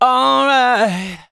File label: vocals sung F#; Techno Trance Essentials